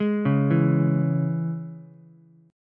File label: Ab Suspended 4th Sharp 5th Ab, Ukulele; 1,1,0,x; arpeggiated; JGuitar